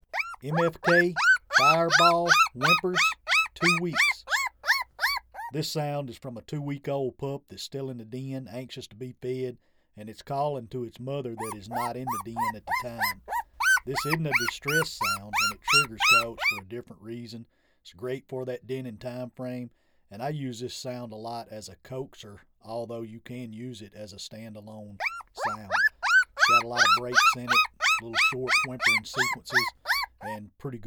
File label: Fireball Whimpers, Game Calls; 2wks